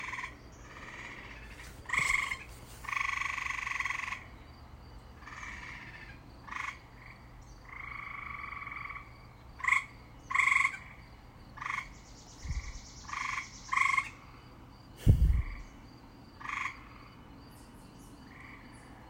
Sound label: gray tree frogs